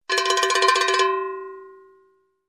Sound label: Metal tinkling sound